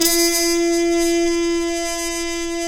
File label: BRS TPTHA, Harmon Tps S; Roland L-CDX-03 Disk 2; Harmon Sect